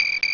insetti insect; animali

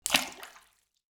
acqua water, natura

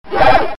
suoneria botton; beep

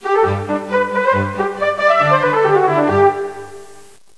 suonerie classica; musica classical

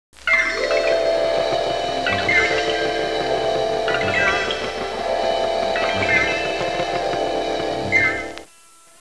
musica strumentale

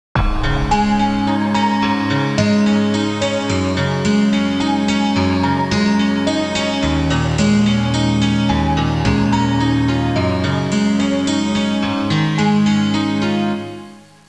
musica elettronica; electronic

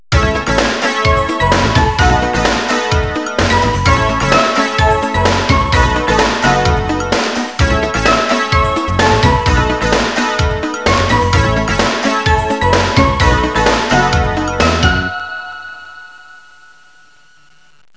musica elettronica; electronic

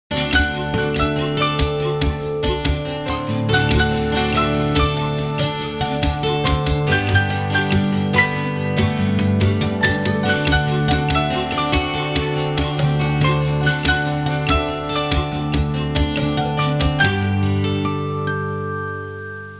suonerie musica hawaiian

